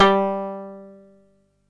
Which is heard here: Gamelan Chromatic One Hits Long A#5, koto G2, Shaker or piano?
koto G2